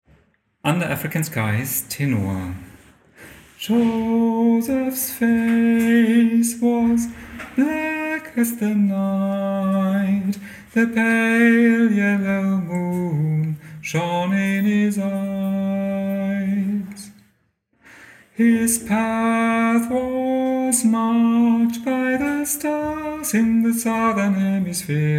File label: Tenor